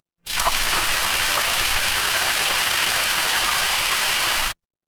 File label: water stream